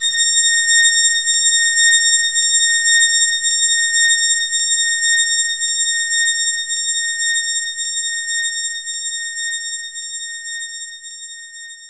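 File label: Pipe Organ G#2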